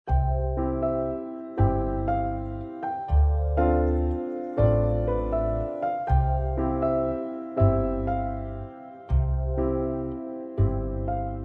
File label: music Garden